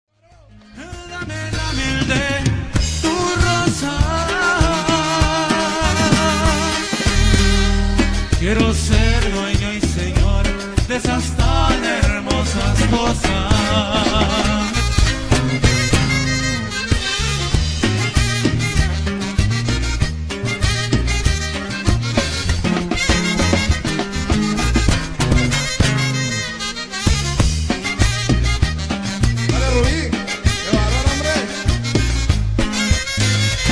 sax solo

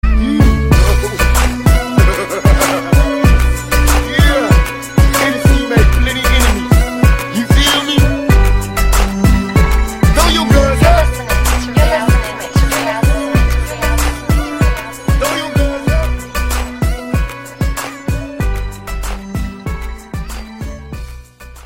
cool rap dj; hip hop

